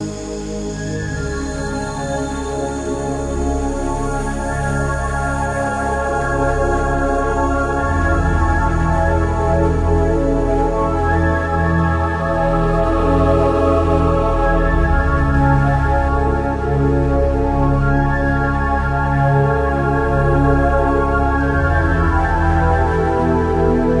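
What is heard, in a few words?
Ambient, Space ambient; Спейс-эмбиент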